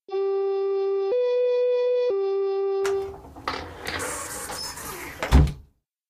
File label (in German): sbahn tuer zu; minecraft